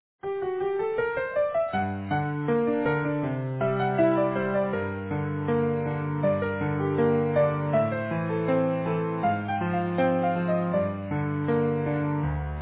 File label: Easy Piano